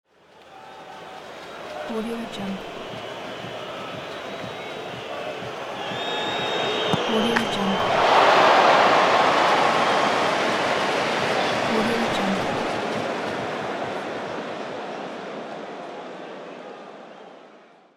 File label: goal and disappointed fans